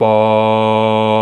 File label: VOX, Male Scats